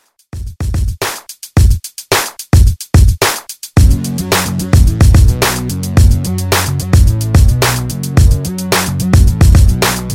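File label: english version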